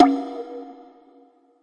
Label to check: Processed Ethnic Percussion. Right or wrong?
Right